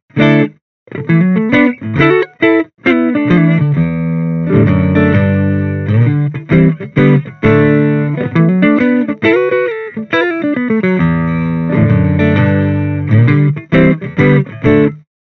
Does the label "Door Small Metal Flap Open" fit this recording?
No